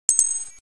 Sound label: Coins jingle